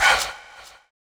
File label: Fx Vox; Equipped Music; Loungin House